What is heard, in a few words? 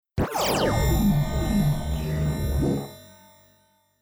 shrink zapper; time-lab